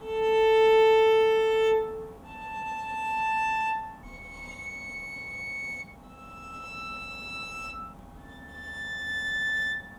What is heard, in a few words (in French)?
harm violon